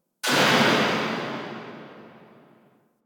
Air Pistol Tail; Warframe; Corpus

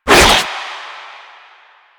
Proto Infested Runner Arm Attack; Warframe

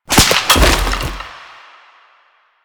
Bunraku String Drop; Warframe; Koumei

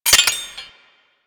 Grineer Four Barrel Rifle mech

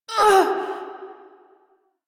pain, female; Dialog; en; Warframe; Railjack Crew; Steel Meridian

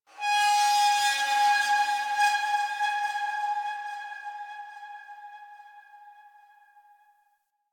Ship Metal Scrap Texture; Ambience; Warframe; Corpus Ship Remaster

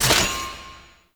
Kiosk Pillar Stop, Ship; Ambience; Props; Warframe; Corpus Ship Remaster

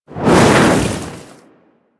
Look Whoosh; Ballas; Warframe; Quests; Chimera Prologue

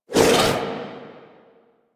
Speargun Throw Windup Foley; Warframe

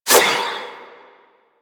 Proj Throw; Army Replicant; Warframe; Hoplite

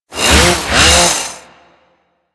Ghoul Sawman Crazy Swipes, Attacks; Warframe; Grineer Ghouls

